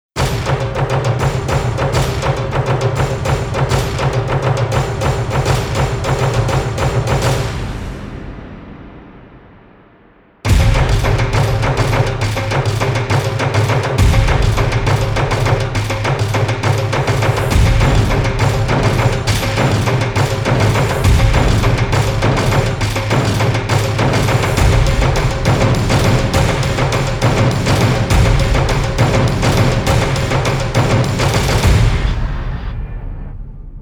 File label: drums